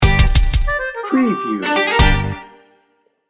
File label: tango sting bumper